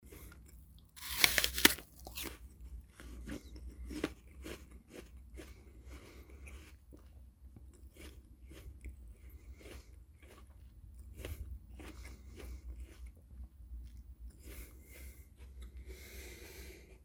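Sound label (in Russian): яблоко; Звуки откусывания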